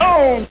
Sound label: pain; Alien Arena; marvinmartian